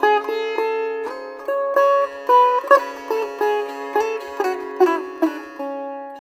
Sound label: SITAR; Spectrasonics; Heart of Asia